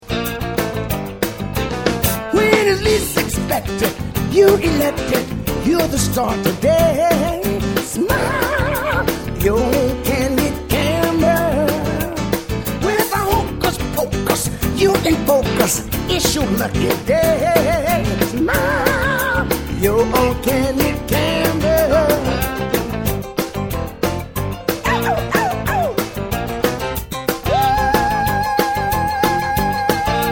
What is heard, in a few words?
Themesong